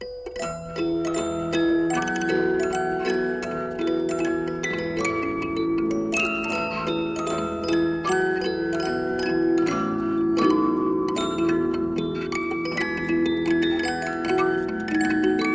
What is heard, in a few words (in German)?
Spieldose 1860